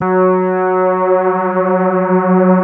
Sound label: FAT LEAD, Powered DX; Wizoo